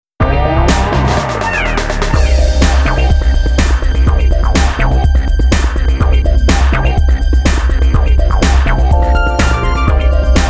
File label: techno